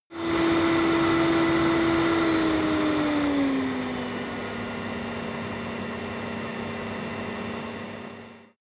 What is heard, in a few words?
vacuum close change speed